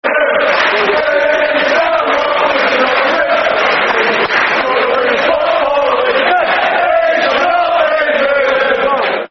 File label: We Shall Not Be Moved, Football Chant; Man United